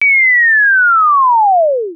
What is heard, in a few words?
fall; electronic; move